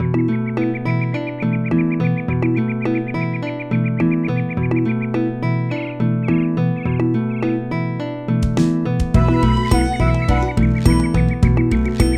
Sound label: Forest; Dark Cloud